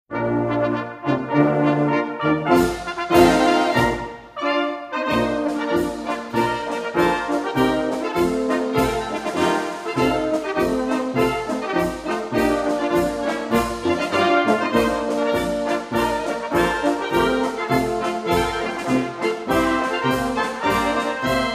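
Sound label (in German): Dörfliche Blasmusik Marsch; Soechtenauer Luggi-Marsch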